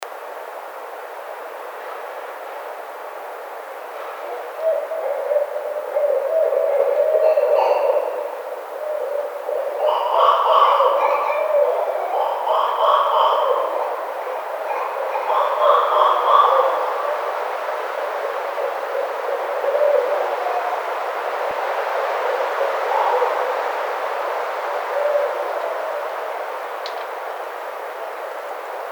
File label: Barred Owl